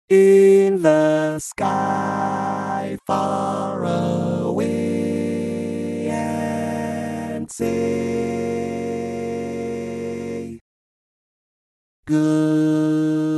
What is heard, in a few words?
All Parts, barbershop tags